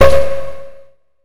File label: kill